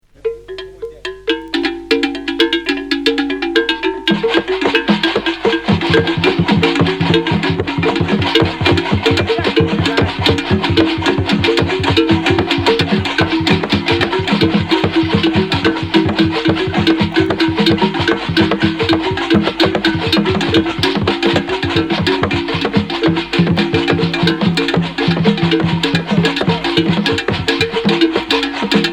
musiques du cameroun

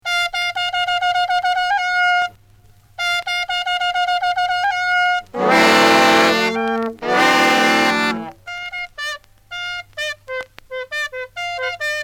musique centrafricaine

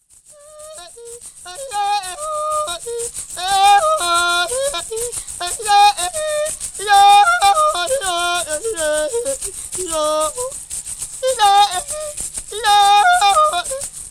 pygmees

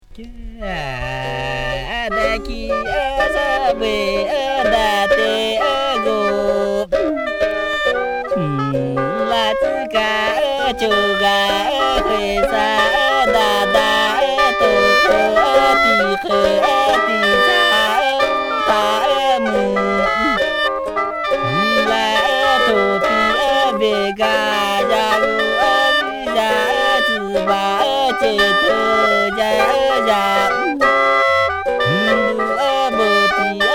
musique triangle

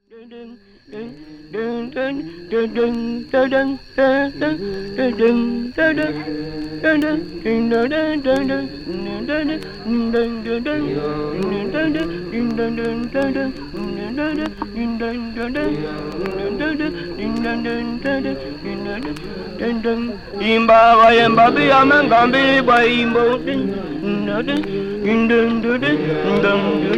pygmees moyen congo

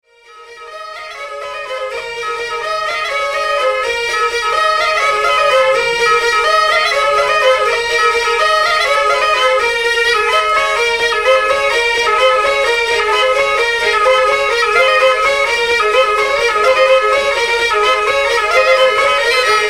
musique grece

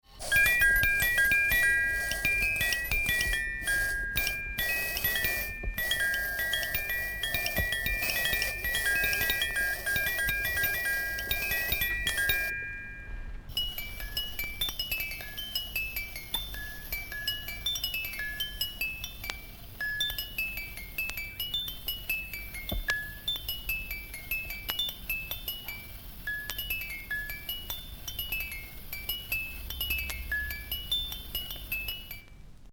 boite a musique

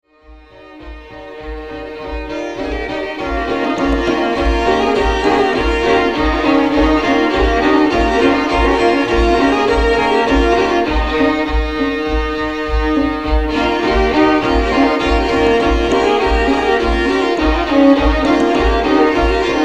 trio violon

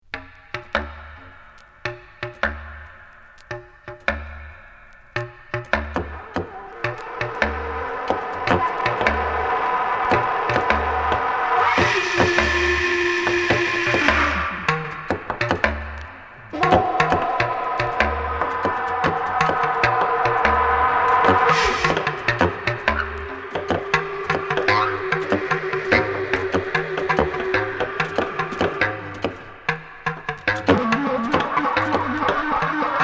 le quatuor de saxphones

